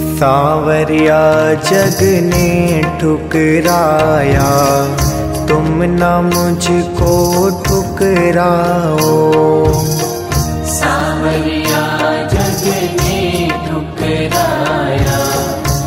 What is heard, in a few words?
Khatu Shyam Bhajan